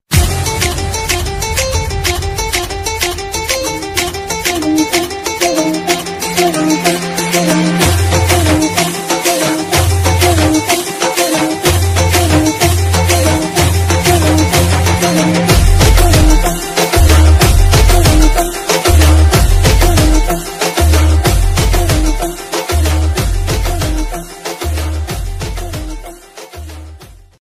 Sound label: Garba BGM, Navratri special